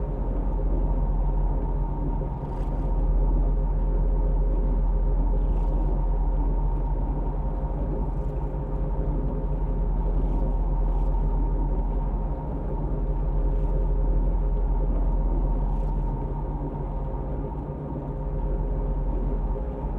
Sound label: ship idle; azaryn; Stellaris; paragon; ships